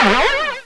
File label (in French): echec; MOBICLIC41